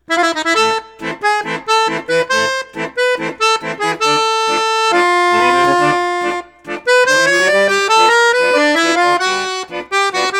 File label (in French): registre basson flute juste, accordeon; perle d'or